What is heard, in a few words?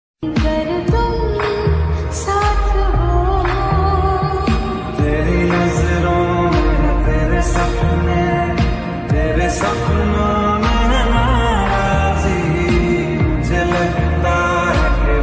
Sad Love Ringtone